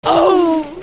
howl; howl at the sun

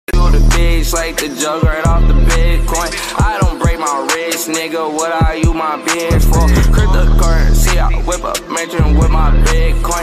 bitcoin ringtone donation song; tfue

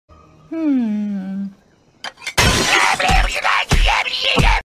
smashing plates on his head; mort

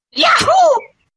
yahoo

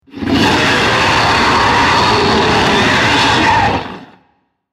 rex roar

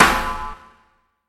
hitsound

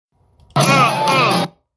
ugh ugh

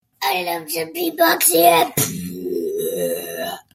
beatbox; i love to beatbox

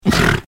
fart crappy

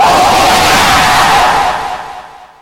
jumpscare sound

